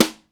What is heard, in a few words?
HR16 BRUSH; Crypton - DrumBase5000